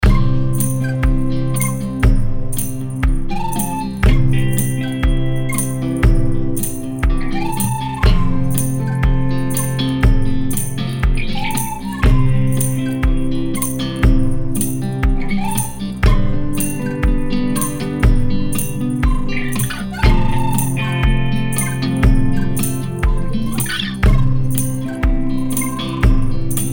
Folky Song Groove in C

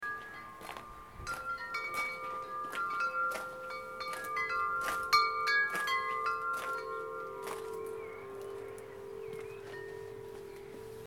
Walk on Gravel Windchimes

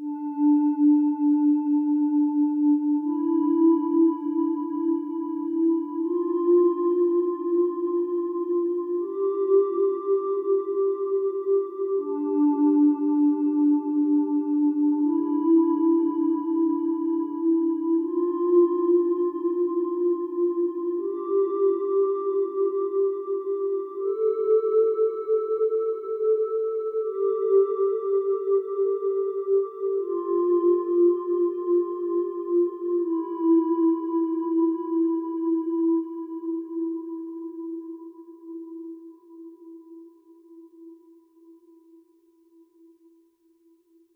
ambient Pad

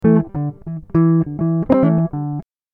Em 100 GTR Riffs

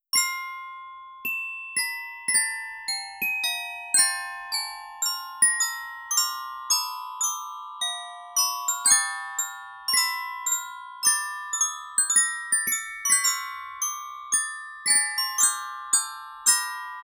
glockenspiel 110bpm

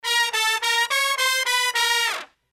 brass loop

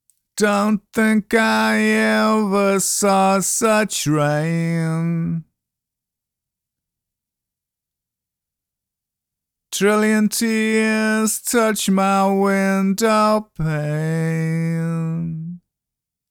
Blues

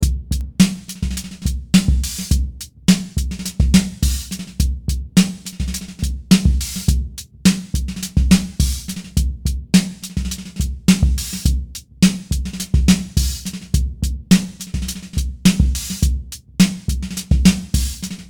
Designer Drums

